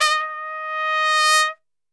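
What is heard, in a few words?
D#3 trumpet; Best Service; Brass Super Section; TRP SWL; 90 s Sample CDs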